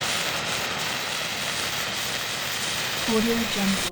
smoke intense air rush fire

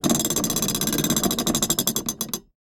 ratchet large metal; prosoundfx

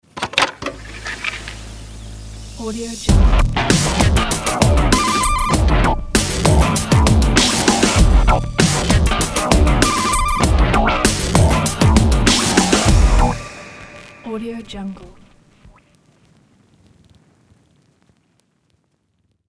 podcast technological breakbeat logo

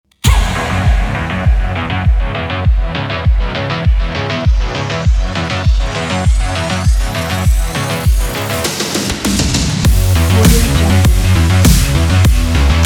action sport

